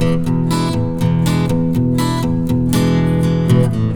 Acoustic Guitars; ACID MUSIC

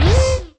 trapdoor; Geschicklichkeit; Bricks Of Egypt

